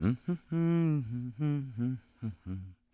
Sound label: humming; The Sum of All Fears; English Voice